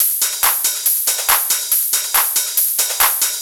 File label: Loop 140 BPM; Vengeance Samples